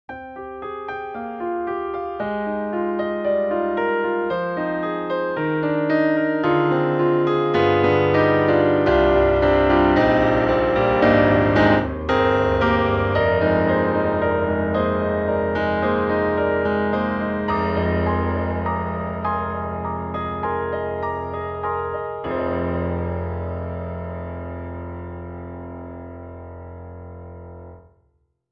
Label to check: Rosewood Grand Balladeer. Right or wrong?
Right